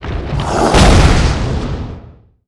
attack; DarknessKing; SwampOfDarkness

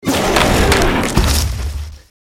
totem golem death

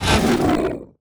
Dead miner hit; KuberaMine

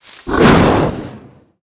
Mutantile attack; SwampOfDarkness

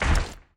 Blood Assassin move

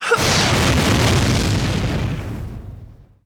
magician attack; ScorchedCanyon

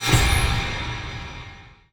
Kethotum potal

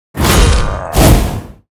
Shoveler attack; kubera; KuberaMine

